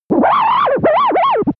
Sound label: earth miserable radiofrequency connection